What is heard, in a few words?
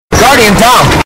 earrape; guardian down